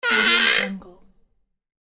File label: cartoon door creak